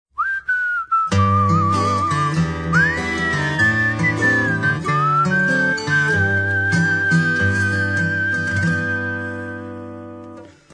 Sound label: whistle